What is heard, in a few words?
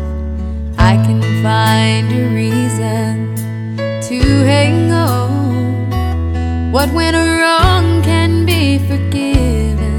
country